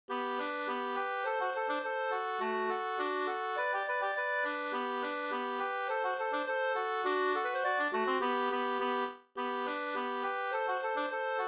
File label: polka